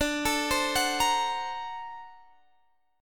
D 7th Flat 13th D, chord; D7b13 arpeggiated; JGuitar

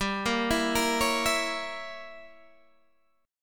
G Diminished 7th, Guitar, Open G

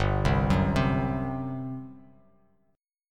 G Major 7th Suspended 4th G down; GM7sus4 arpeggiated

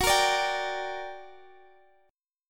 G Minor Major 7th F sharp

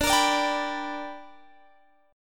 B Minor Major 7th Sharp 5th

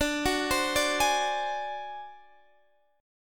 D 7th Flat 5th D down Guitar Standard, chord; D7b5 arpeggiated; JGuitar

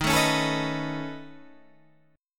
Db 7th Suspended 2nd Sharp 5th Eb, chord shape

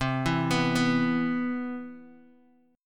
C Major 7th, Bass Standard; CM7 arpeggiated

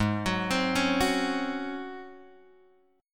G sharp 11th G sharp; G#11 Chord {4 X 4 5 2 2} arpeggiated

